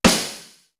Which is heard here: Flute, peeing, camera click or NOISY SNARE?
NOISY SNARE